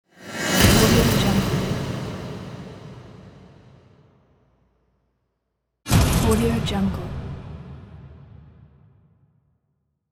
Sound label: explosion transition